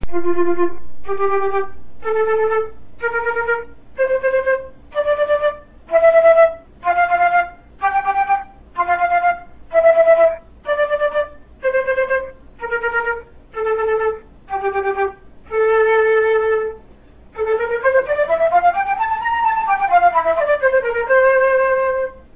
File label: Double tongue scales, flute